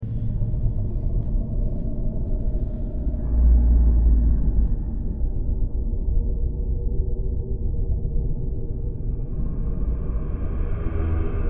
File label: ambience dungeon